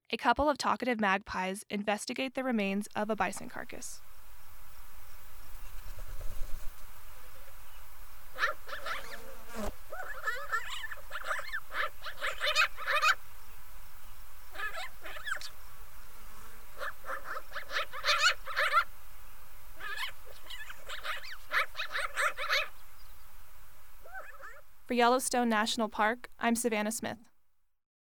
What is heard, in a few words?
black billed magpies; sound and light ecology team